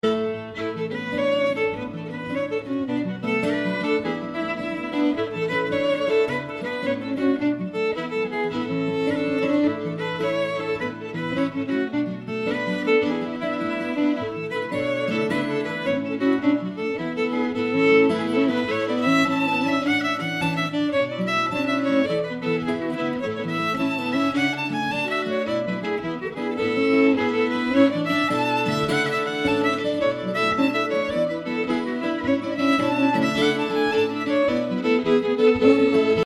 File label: Kitchen Jig